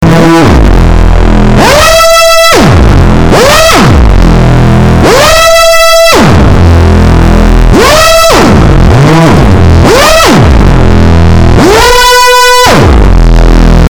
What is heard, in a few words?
inferno fanfare